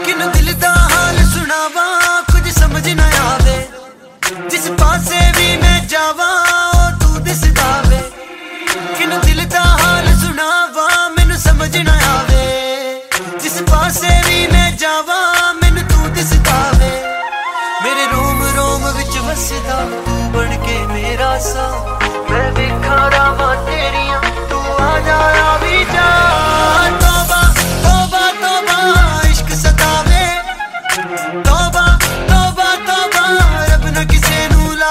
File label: punjabi ringtone